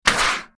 Air Mortar Blast From Close Point of View, At 75 Pounds Of Pressure, X3